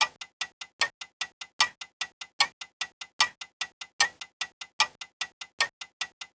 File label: ticking; svencoop; bridge